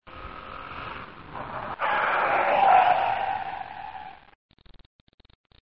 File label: hard brake